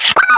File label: CARD LOCK